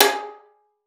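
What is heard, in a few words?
violin pizzicato G#4; Xsample VOL-5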